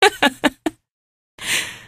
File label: laugh; hl2; eli lab; Source engine